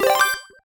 Dice

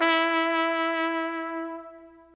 Trumpet

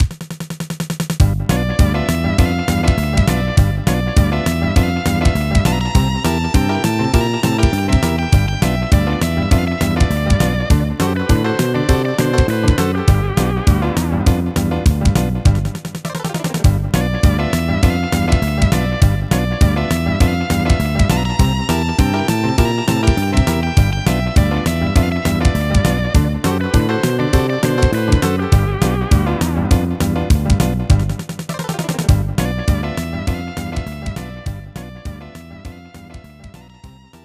Victory; super zugan; snes

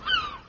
seagull; super trench attack 2

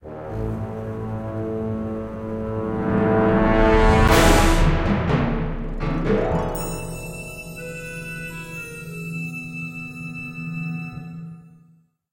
Ambient Theme

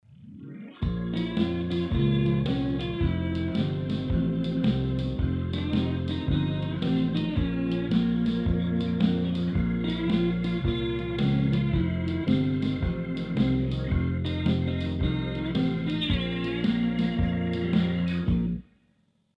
Blues Radio; Luigi's Mansion 3; E.Gadd Selection

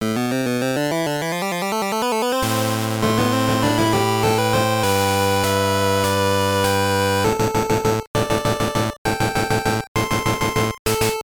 Jingle; World Heroes 2 Jet 1995 SGB

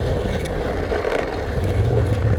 Wheel Loop; Lucius III

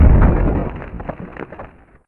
Building Broken

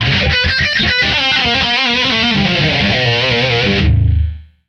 Guitar Shred; Back to the Future: The Game; Android; PS3; Wii; Windows; Xbox 360

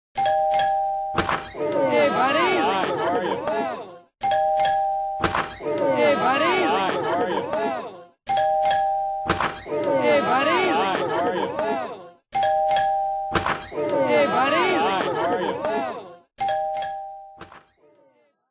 Doorbell; Kid Pix 3 Deluxe; Windows

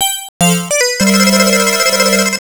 Round Start Music; Pac-Land; X68000

